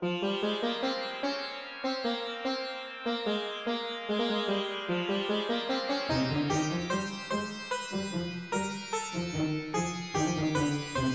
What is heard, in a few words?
theme sitar